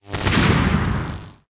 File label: Electric Boom